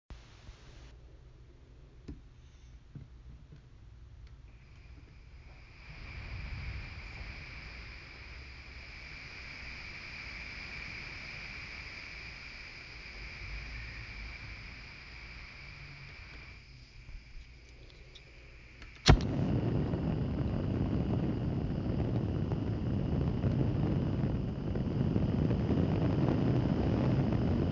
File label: Torch